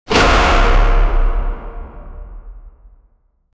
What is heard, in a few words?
deep guttural